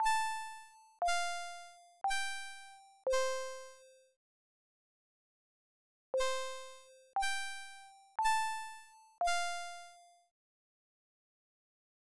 School Bell

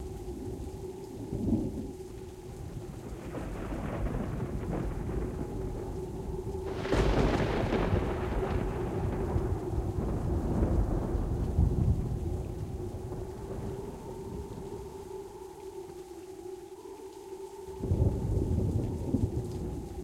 Ambiance - Bomb

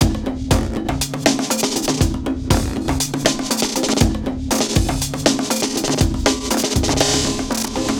perc loop 120bpm; chicory a colorful tale; ps4

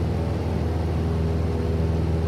Flight

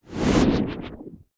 SFX Powershot Cancel; Pangya; Fantasy Golf

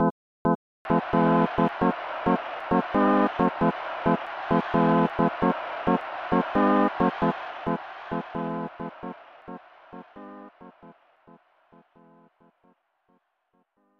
Stadium Organ; snes; Brett Hull Hockey

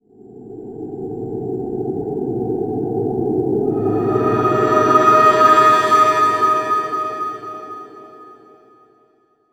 environment stinger

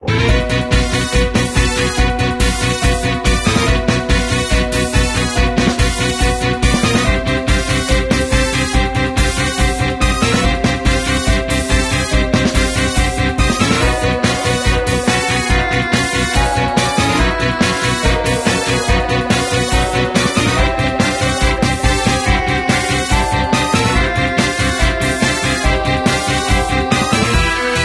Bgm Boss Battle; Pocket Academy 3

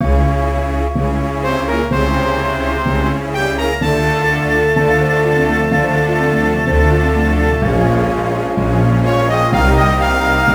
Game Over; Super Robot Taisen Z PS2

